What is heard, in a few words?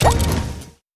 Bomb Powerup